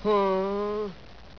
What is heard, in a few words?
whine, japanese